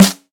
Sharp Acoustic Snare G Key, steel snare drum tuned to the G note